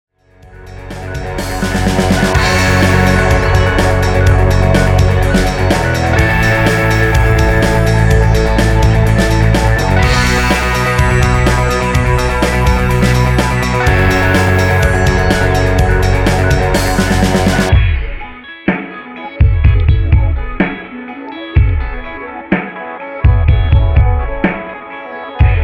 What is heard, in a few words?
125bpm remix